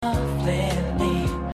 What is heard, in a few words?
Rhodes Note